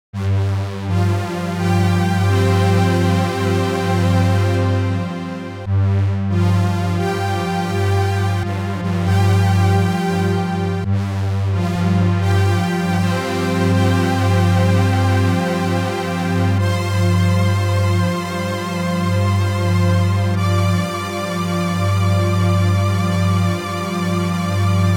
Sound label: Long String; Cherry Audio GX-80